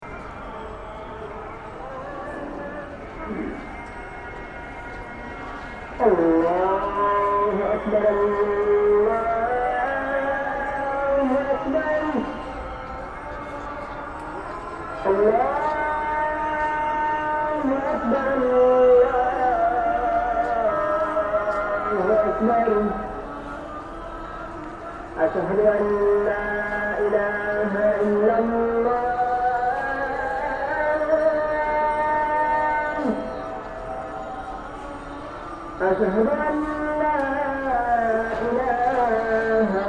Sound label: call to prayer, bangladesh